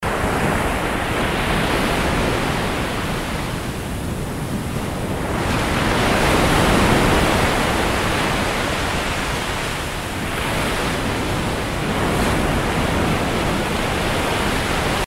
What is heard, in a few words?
Swell Ocean